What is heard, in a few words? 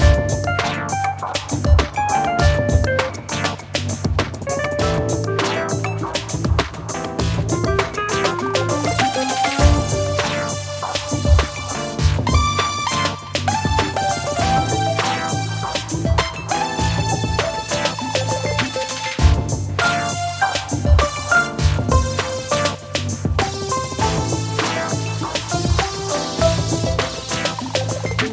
relax loop